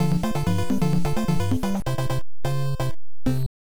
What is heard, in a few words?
Burning Samba; Ganbare Goemon Gaiden 2: Tenka no Zaiho; Family Computer